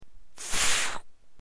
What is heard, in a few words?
bubble refill